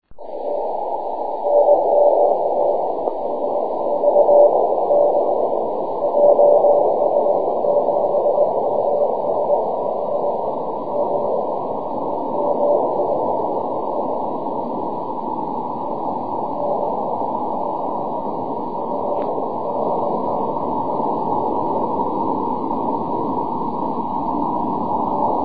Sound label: 6m cw